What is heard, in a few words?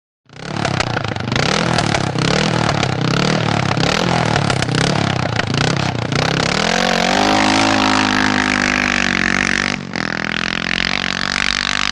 Away Trials Bike Revs